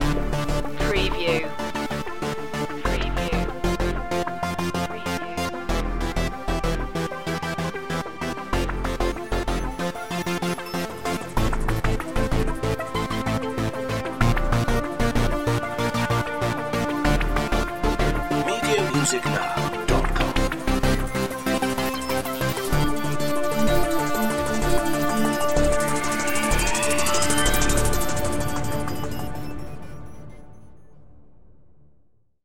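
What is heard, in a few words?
time traveler electronic no percussions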